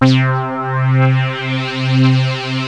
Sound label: reso synth; jazz jackrabbit 2; M8